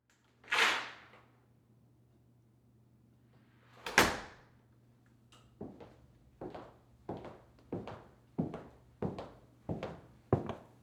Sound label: Foley Footstep Cowboy Boots Open Door Walk Pull Out Chair Sit; Foley Footsteps